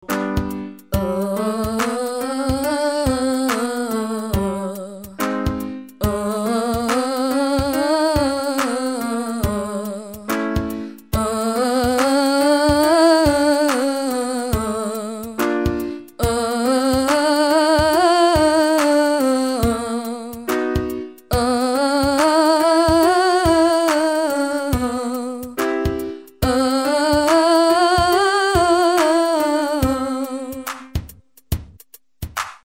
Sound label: Exercise 5 tone scale